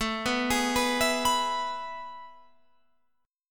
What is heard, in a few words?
A Major 7th Suspended 2nd, Guitar, Open F; AM7sus2 arpeggiated; 21,18,20,18,19,18; JGuitar